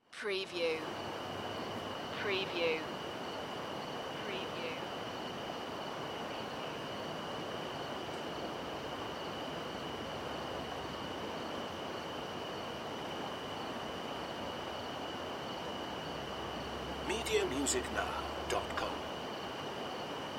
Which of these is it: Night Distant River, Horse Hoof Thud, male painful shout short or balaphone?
Night Distant River